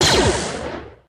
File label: blaster imperial, Guns Fire